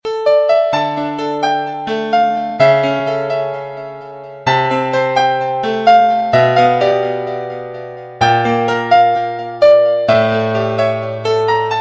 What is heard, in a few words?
Piano morning